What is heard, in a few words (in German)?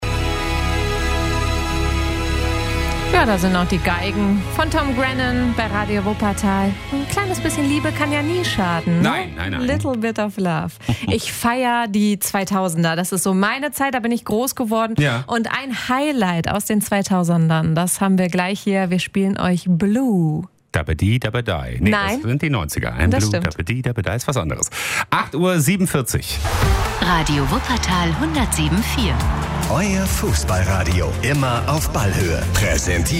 kleine slowenienfans; slowenien trainiert im stadion am zoo